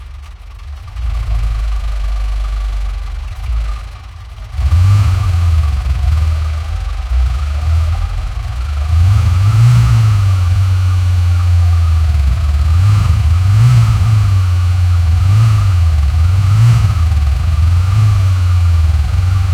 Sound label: v16 diesel with